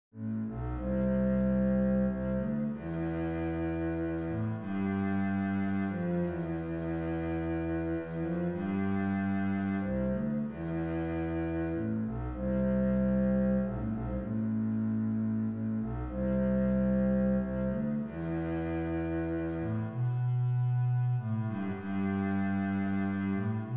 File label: barcarolla bass